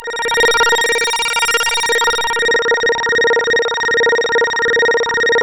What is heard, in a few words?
A#, Synclav FM; Big Fish Audio; SPACE1